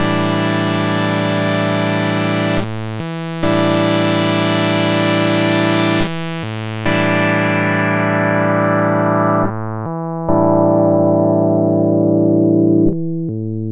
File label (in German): Voice Calibration Statisch; TAL-Pha